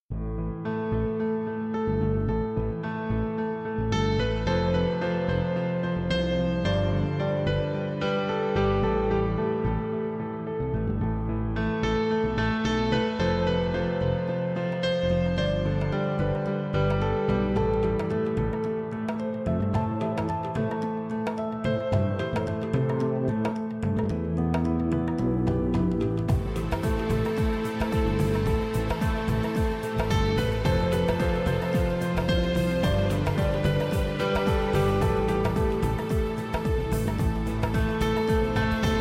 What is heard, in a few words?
music dance piano